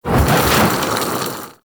Spit Attack Projectile By

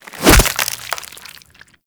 Finisher Front; Desert Skate

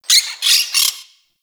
Forest Creature Squawky High, Ambience; Warframe; Grineer

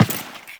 step default, footsteps; Warframe; Teshin

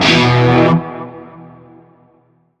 Shawzin Chromatic Chord; Warframe; Narmer Shawzin

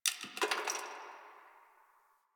Ice Crack One Off; Warframe; Ambience; Nineteen Ninety Nine

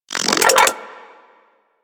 Leech Projectile Bite; Leech Osprey

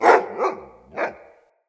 Pack Wolf Alerted Bark; Animals; Perception

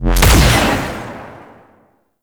Alt Shot, pistol; Crp BE Plinx; Blastor Pistol

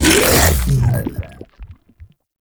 Devour Impact D; Warframe; Grendel

